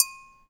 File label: butter dish, Bells